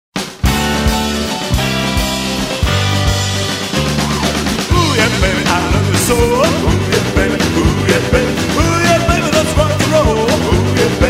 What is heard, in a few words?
ooh